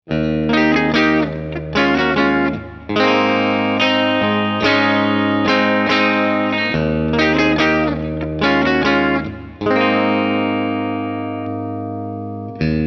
Clean Bridge Bridge Middle Middle Middle Neck Neck; Fullerton